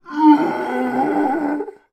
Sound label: horror monster zombie male groan; sound effects one